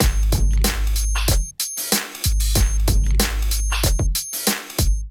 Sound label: Kit groove 94 bpm, Drums; MIDI Clips; Full Lotus; Ableton; Factory Packs; Chop and Swing